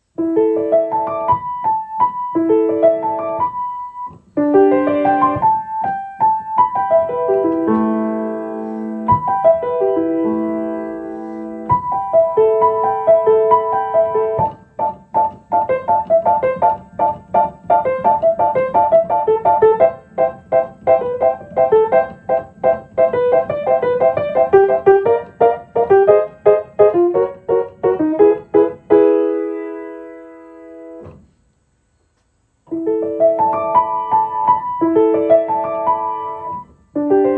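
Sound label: Dancing leaves, Piano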